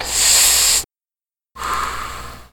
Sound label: exhale toke